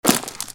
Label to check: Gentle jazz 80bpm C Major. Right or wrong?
Wrong